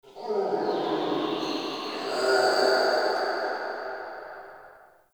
Cave Distant Chatter, Ambience; One Offs; Neathuns Root Cave

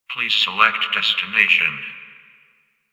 Messenger Map Open; Ambience; Warframe; Nineteen Ninety Nine

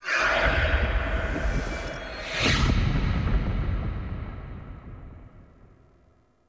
Death Shrine Revive; Player; DeathState; Envoy

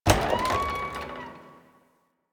Tailor Shop Door Open; Warframe; NineteenNinetyNine

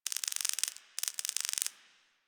Night Insect Click; Ambience; One Offs; Insects; Warframe; Quests; The New War; Drifter Camp

